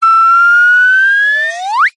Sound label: cartoon slide whistle ascend climb medium